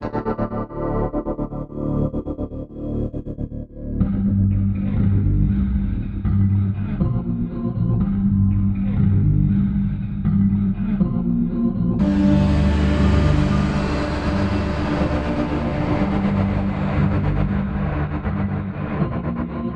air ship, roundwound

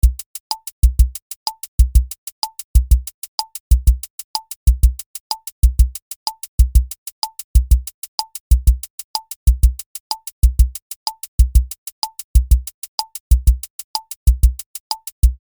slow rock; tiny pops; superwave

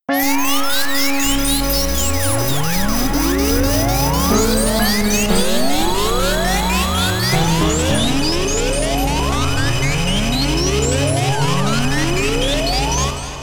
fx radiosignal; the transmitter soundset

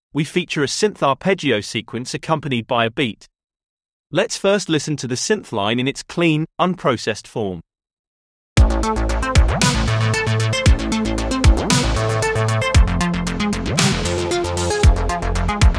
orbiting synth music; spintracer

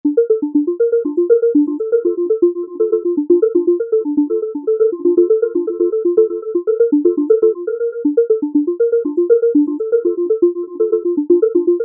synth bunka

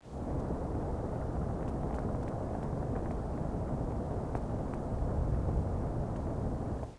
lo fi warm real rain; ambience and background fx; nova sound

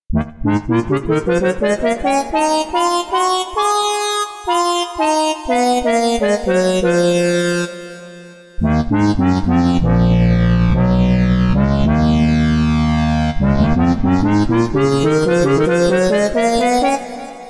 voicesyn; the transmitter soundset

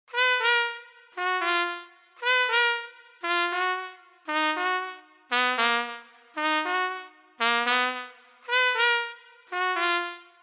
sea monsters brass trumpet; chiqui audio

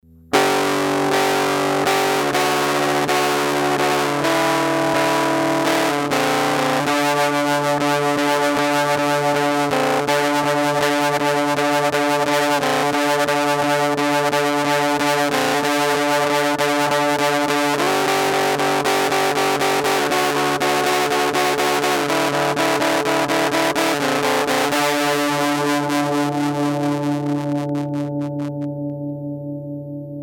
fuzz face clone

